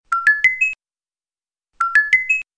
sms sound

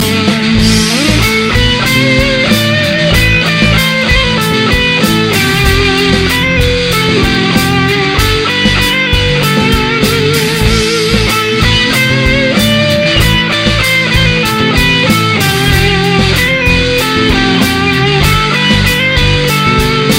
Guitar rock

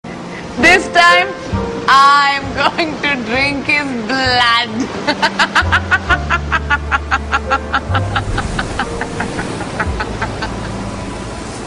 Laugh

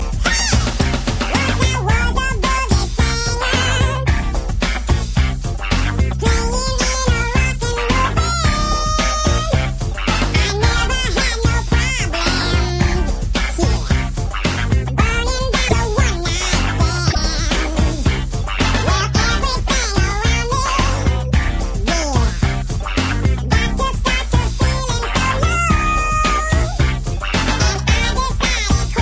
Funky Music

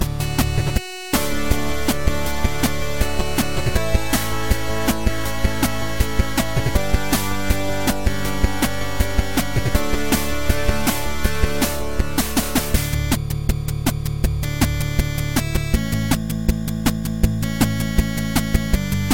8 Bit

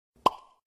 Pop

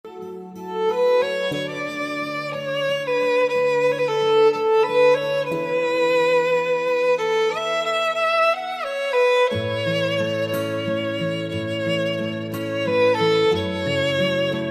Perfect intrumental

